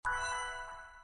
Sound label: click